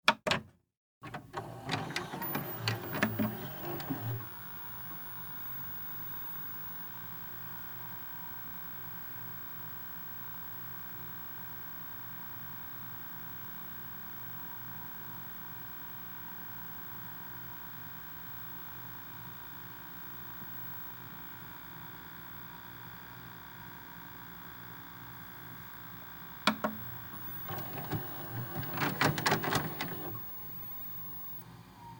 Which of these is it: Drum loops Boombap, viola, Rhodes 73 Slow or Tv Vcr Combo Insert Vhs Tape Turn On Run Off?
Tv Vcr Combo Insert Vhs Tape Turn On Run Off